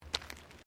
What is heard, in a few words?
FOOTSTEPS THONGS (FLIPFLOPS) CONCRETE STEP